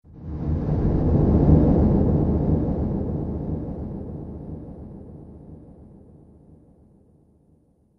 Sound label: Horror Explosive Impact; Miscellaneous Impact Sound Effects